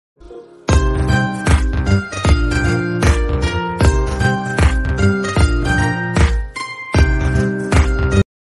instagram trending bgm ringtone mobile ringtone

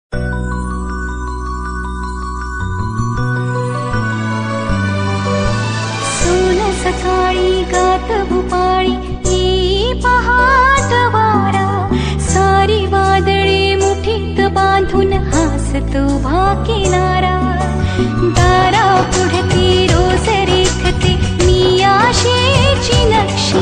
Marathi famous ringtone

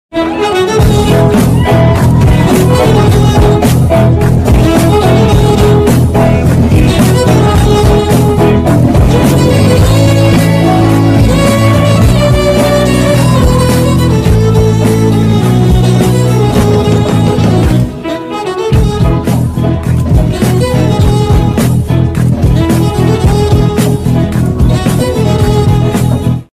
entry medley ringtone band bgm; animal ranvijay entry

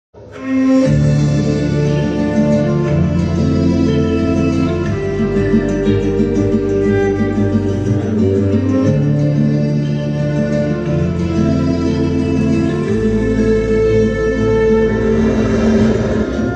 emotional bgm ringtone; michael movie

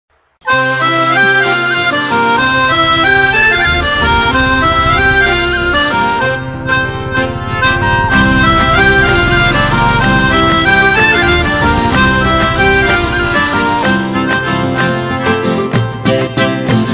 love theme bgm ringtone; katamarayudu

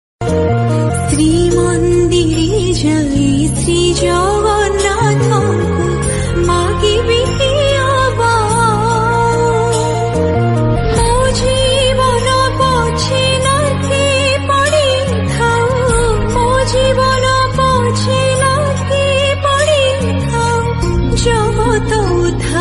bhajan ringtone; jagannath; jai shree jagannath

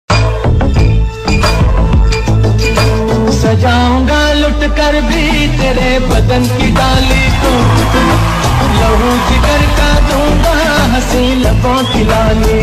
remix ringtone

